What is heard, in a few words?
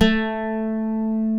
BOUZOUKI A2; AKAI S6000 CD-ROM - WorldSounds